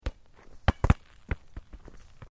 Sport Soccer Bounce Multiple Concrete, Soccer ball sounds